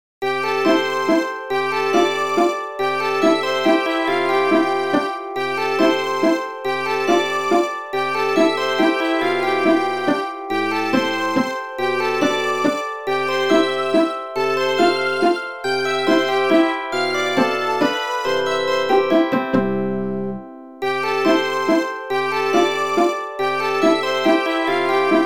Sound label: Conversation; Athena The Music